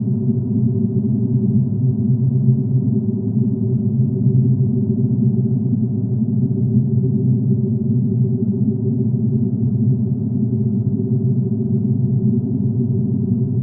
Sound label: underwater transmitter hum